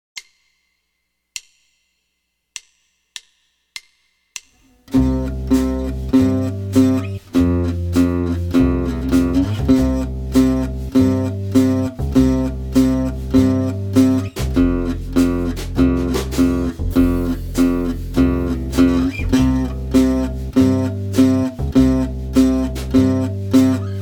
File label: 12 Bar Blues String 6 Roots Only, jazz guitar